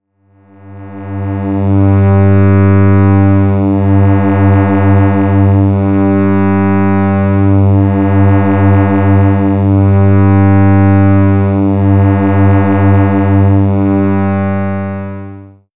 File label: programmable wavetable